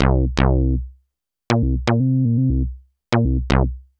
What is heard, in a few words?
Synth